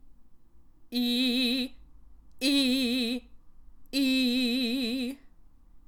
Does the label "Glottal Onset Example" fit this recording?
Yes